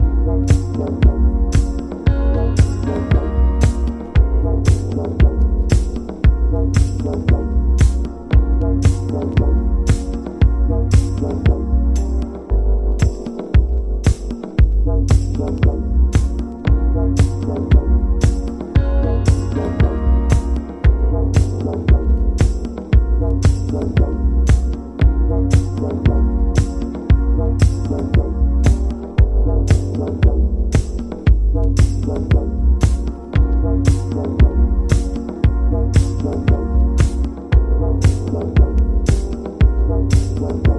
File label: DOWNBEAT